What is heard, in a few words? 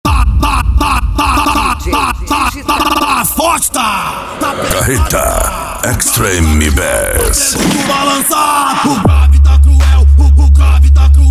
extreme bass; carreta